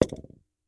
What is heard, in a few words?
Foley Ceramic Flower Pot With Dirt Set Down Ten Inch